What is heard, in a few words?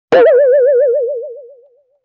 Saw Wobble; Vintage Cartoon